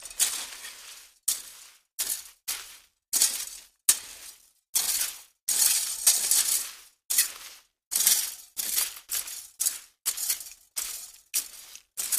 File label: Rake Leaves